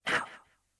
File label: Damage